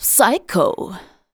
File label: vox, Techno Trance; Ueberschall